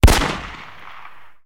rifle shot; guns; npc; War Thunder

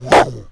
Wolf Hit Vox; Civ4 warlords

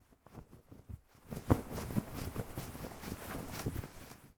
wraphead; Rust

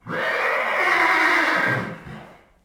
whinny large; Rust

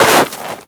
foot snow, footsteps

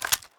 pistol drop; Escape from Tarkov

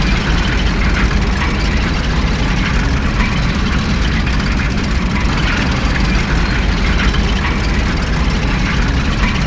Tank Move Light; DCS

